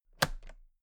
toolbox latches close heavy

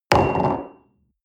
coffee place table hard wobble, restaurant cafe

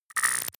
deny click; maschinen; signale; soundpool

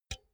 pull out cinch quick; objekte; elektronik

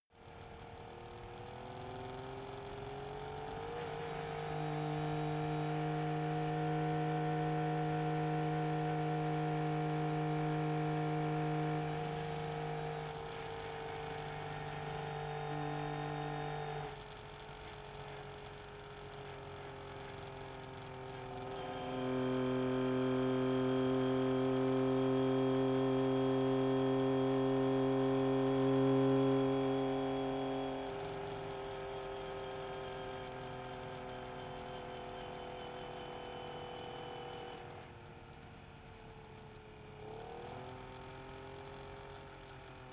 car saab 92 drive slow engine

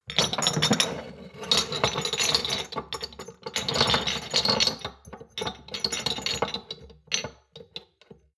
shot glasses clatter long, gastronomie; berufe; soundpool

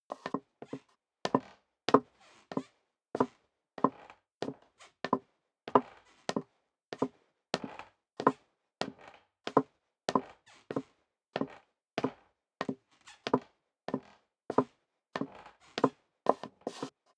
step high heels wood creak downstairs sequence; schritte

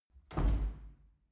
wood door old reverberant soft

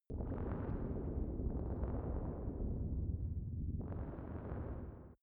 space energized clouds, Science Fiction; atmosphaeren umwelt

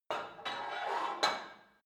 put weight onto barbell quick, fitnessstudio

